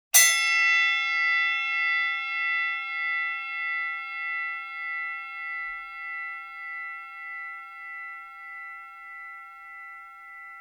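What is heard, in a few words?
gong sound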